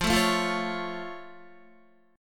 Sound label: F Augmented Guitar; strummed